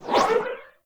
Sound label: fire spear slam cast; Warframe; Nezha